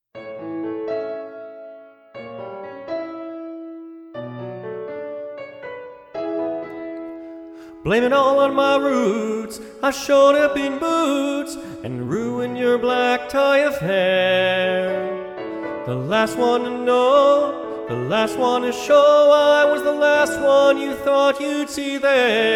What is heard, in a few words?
Tenor; Practice Room